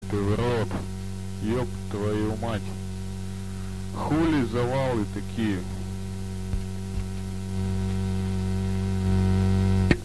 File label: instrumental; thrash avantgarde